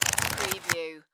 Book Paperback Page; sound-effects-bible

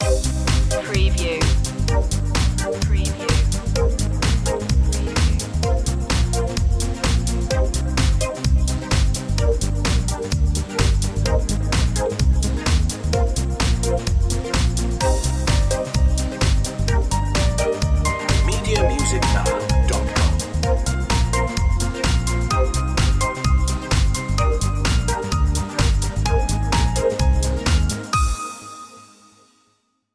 up to this beat

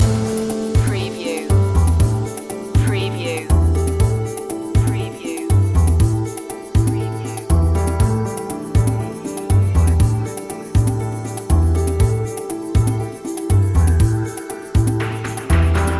eastern vibes loop

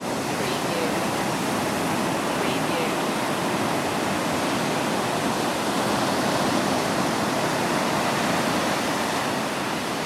ocean ambience

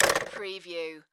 Telephone Receiver

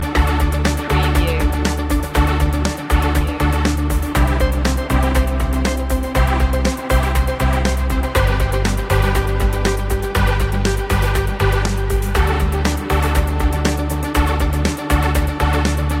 synth inspiration loop

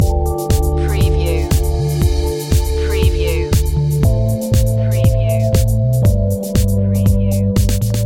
pulse loop

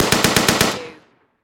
Machine Gun STG44

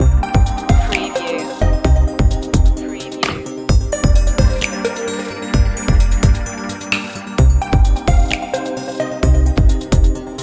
futuretronics loop

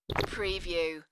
Swalw

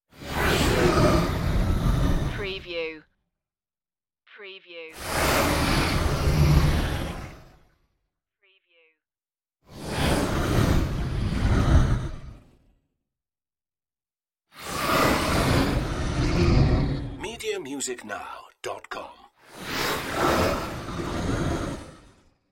windy flyby turbulence

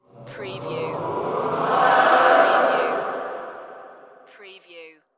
Kick Off Yell

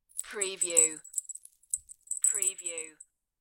Christmas tree bell decoration; beanstalk audio

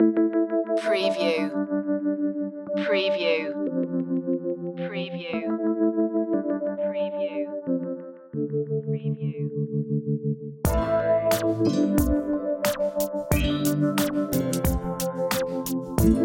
hip hop

